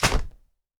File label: UMBRELLA, HANDY PERCUS; AKAI S-Series CD-ROM Sound Library VOL-5